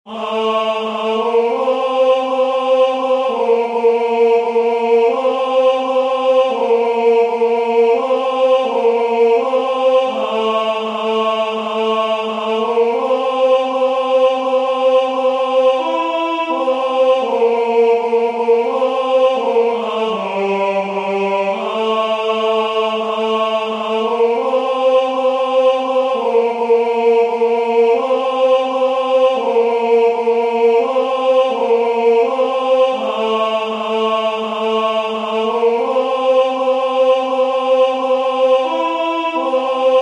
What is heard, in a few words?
Tenor